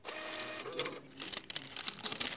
Kassenbon

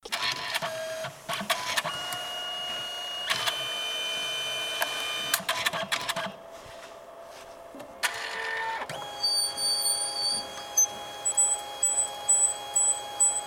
Sofortbilddrucker

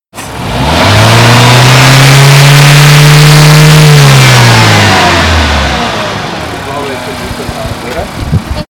Motorstartgeräusch

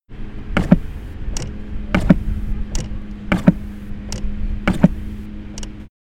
Wippe

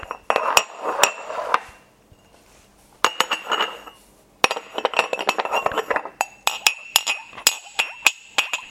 tassen

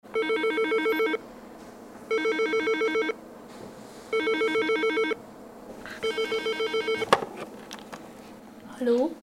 telefon

